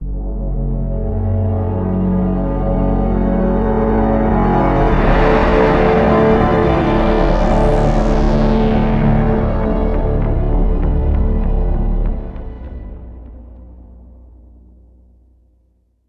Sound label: Elevator Stinger; Mass Effect 2